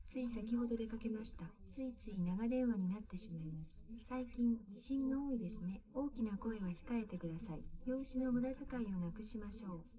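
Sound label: female, live recording 130ms 1m; SiSEC11; underdetermined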